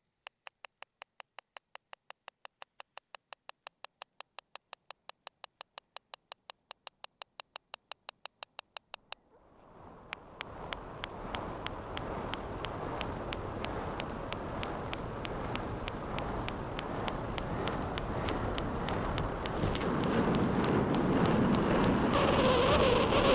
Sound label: chase